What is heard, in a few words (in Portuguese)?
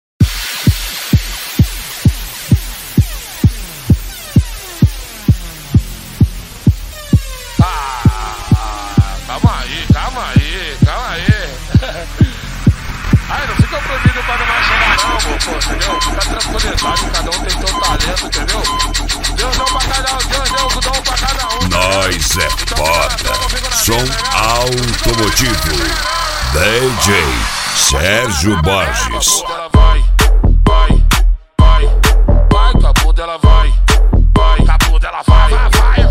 eletrofunk; som automotivo